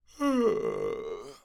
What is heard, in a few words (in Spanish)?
Bostezo